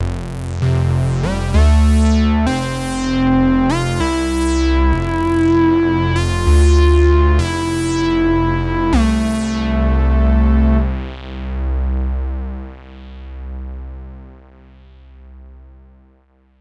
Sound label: analog pulse